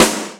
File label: Yamaha CS6, Drums